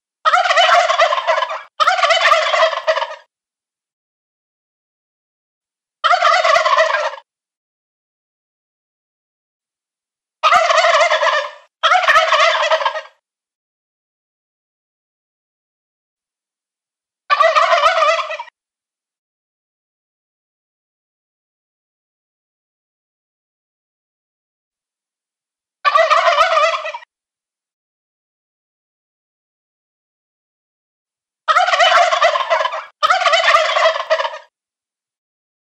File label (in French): Dindon Glou